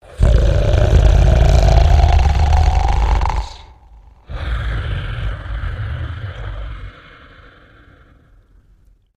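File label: Character Giant Sleeping Snore; the unfinished swan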